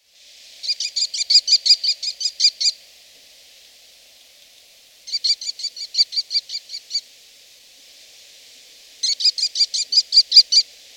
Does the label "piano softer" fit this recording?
No